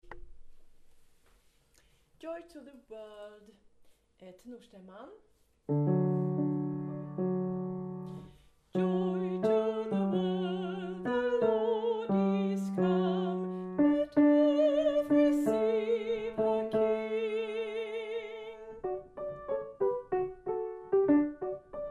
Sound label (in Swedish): tenor